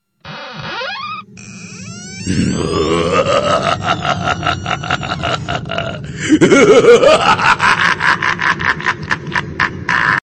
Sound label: Ha ha ha haaaa